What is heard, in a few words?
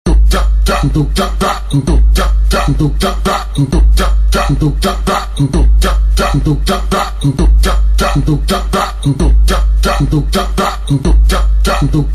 funk groove